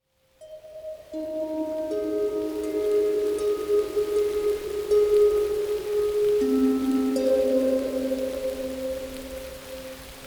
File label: Night Music Rain Sounds to Help you Sleep